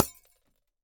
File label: Smash Light Bulb